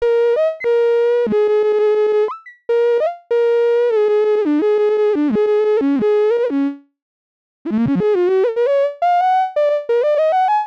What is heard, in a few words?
sax warm blend; blush